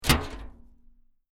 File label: Metal Impact Trash Can Lid Drop; Metal trash can close lid; Muted, restrained metal hit clank; Metal Sound Effects